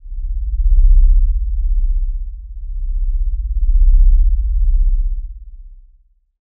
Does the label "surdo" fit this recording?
No